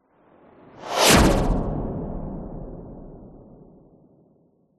science fiction space fighter fly past very fast whizz by